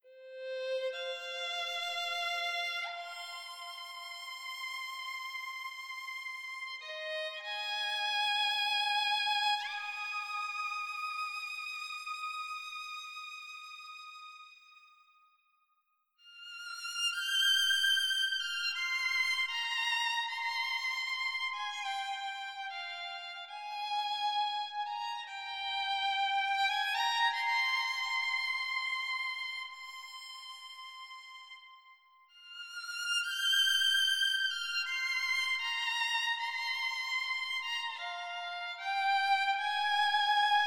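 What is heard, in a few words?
violin; Embertone Friedlander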